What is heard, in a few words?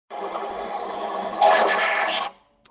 toilet